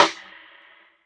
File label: snare; dough-samples; eddyflux